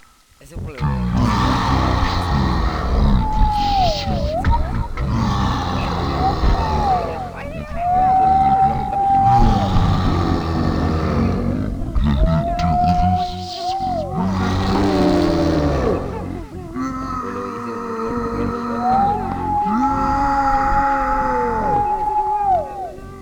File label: evil crowd